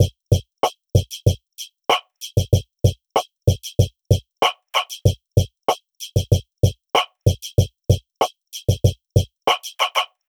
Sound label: loop